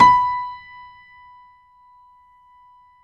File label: PIANO B5, STEINWAY; Greytsounds - Philip Wolfe David Crozier's Saxophones; GR8 STEINWAY